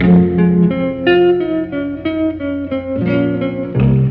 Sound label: guitar